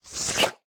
Kiosk Juice Squeeze

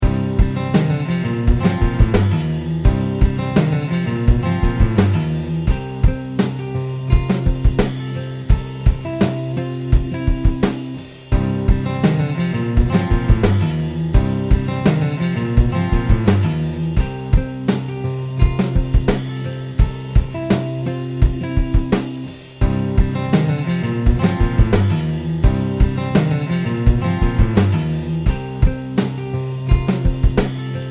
JukeBox Country country-rock

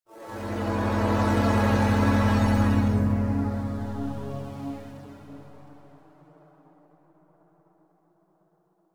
Electric wave

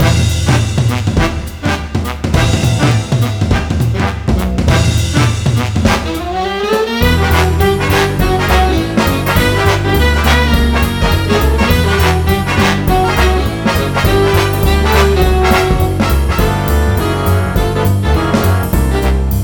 music droid dance; Star Wars The Clone Wars Republic Heroes

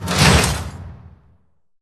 Gate Open

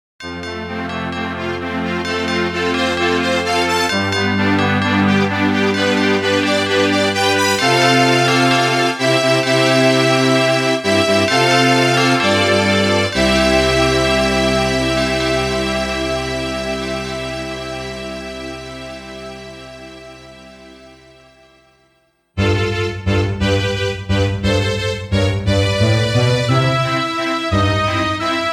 Wedding Bell; Estpolis

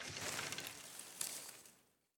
Bush Movement; We Are the Dwarves

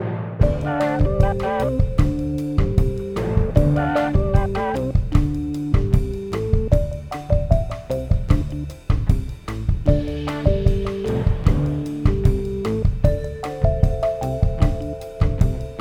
Social Hub Ambient Low; Plants vs. Zombies Battle for Neighborville

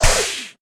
Impact; Shieldmaiden Remix Edition

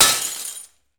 glass break

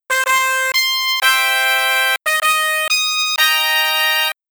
Intro Jingle; Rick Dangerous 2; Amiga

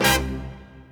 Combo Pan Hit; Party Crasher

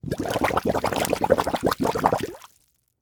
water airy bubbles

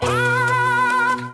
fly; lego my style preschool

